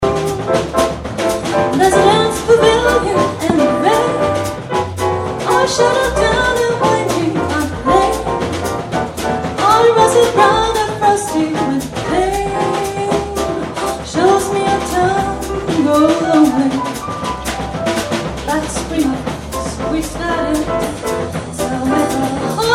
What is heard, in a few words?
quartett latin